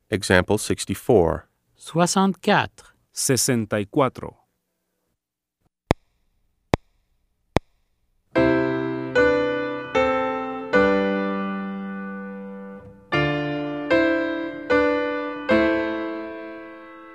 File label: Keyboard